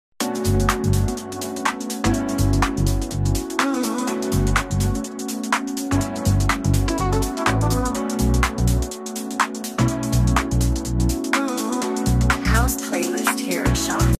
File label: romantic ringtones best love ringtone hindi ringtone sad love ringtone new ringtone